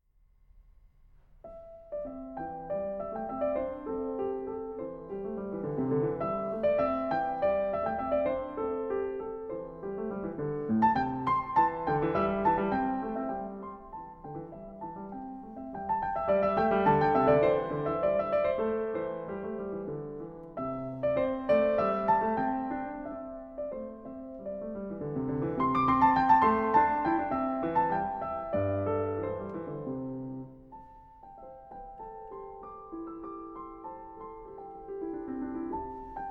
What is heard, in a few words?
The Cowherd's Flute